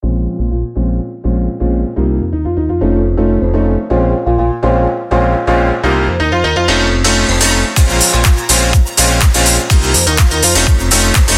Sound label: Pop Piano House